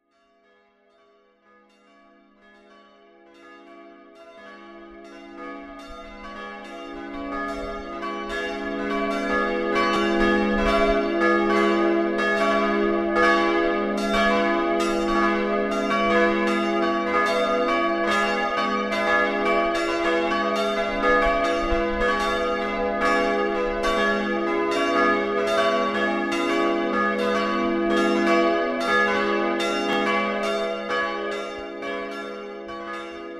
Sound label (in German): Glocken alle; Johannes